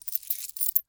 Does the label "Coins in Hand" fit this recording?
Yes